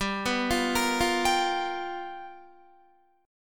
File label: G 7th Sharp 9th G, Guitar; G7#9 arpeggiated